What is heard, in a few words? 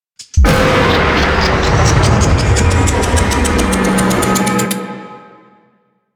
Nuke Scene; Warframe; NineteenNinetyNine